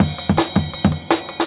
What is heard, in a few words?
Jungle Breaks